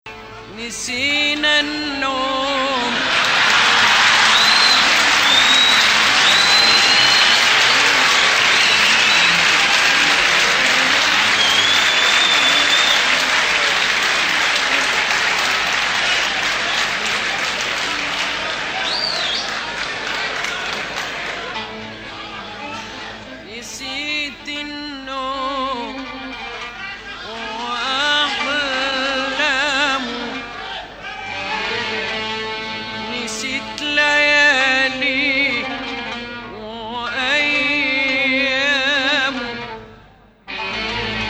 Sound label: Bayati, maqam